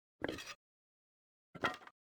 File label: pick up and drop quaterstaff; baldursgate